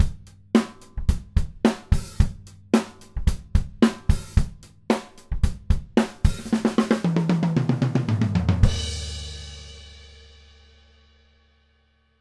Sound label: earthworks TC20 as Drum Overheads